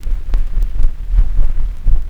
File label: NOISE, Drum & Bass; Ueberschall; Drum & Bass Resonance; 168 BPM